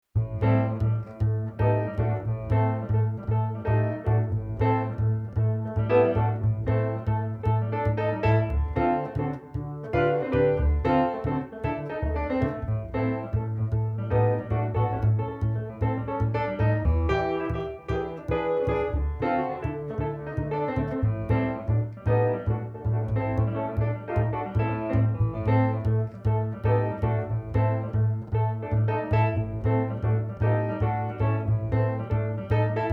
Street Bass Piano Bouncing Blues 115

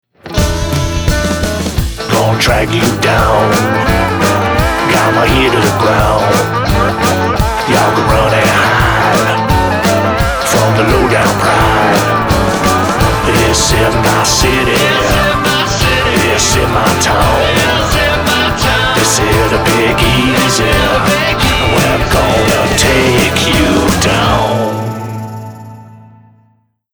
Pride City Blues Mix 168

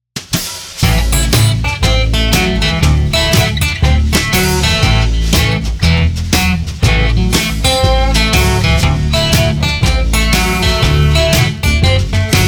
Simmer Down Blues no Harp 120

